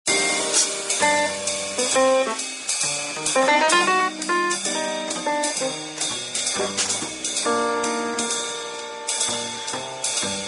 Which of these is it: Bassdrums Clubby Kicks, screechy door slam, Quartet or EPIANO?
Quartet